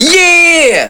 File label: YEEEAAHH, VOCALS; SoundFactory